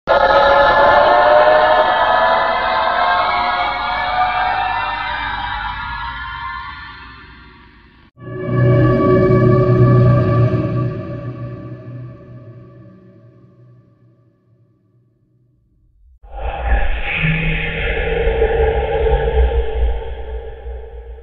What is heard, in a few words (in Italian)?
suoni horror sussurri horror suoni spaventosi creepy sound horror